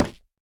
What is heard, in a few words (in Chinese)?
下界木活板门开关声